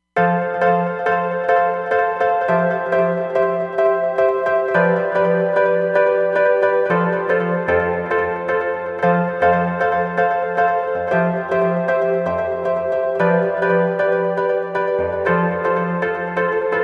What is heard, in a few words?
Roland Fantom XR; Skydiver